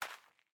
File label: Footstep Sand; Main Character